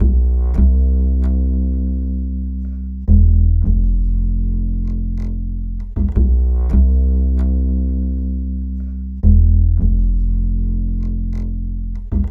Acoustic Bass

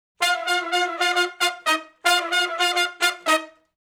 Funk Riff (Eb) unison, Horncraft for R&B; Sections; Crimson Blue and Fabulous Horncraft 4 RnB

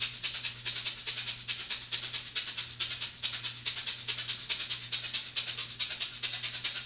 Shaker; Sonic Foundry (Sony Creative Software); Essential Percussion

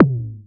MS-20 Tom, Drums; PX7; Digital Sound Factory Protean Drums

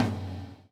GATE TOM, Drums Acoustic; USB Soundscan vol.10; AKAI; 90s sample CD; GATEKIT 3

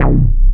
BASS C2 R, Jungle; JGL BASS; USB Soundscan vol.05; Explosive Jungle; AKAI; 90s sample CD

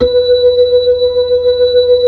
B-3 Hollow; Keyboards of The 60's and 70's; B-3 Hollow Ch

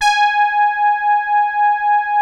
soft brass, poly mid G#5; Music Store - Claudius Diemeter Schwerlos

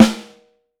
Snare Drum; 6.5X14; Q Up Arts; Johnny.C's Vintage Snare Drum

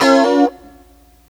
C, FUNK CHORDS; Guitars

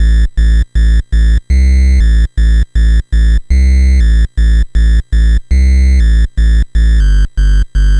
NES Bass, Chiptune; Nintendo Entertainment System; Sonic Foundry (Sony Creative Software)

